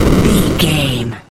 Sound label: retro explosion general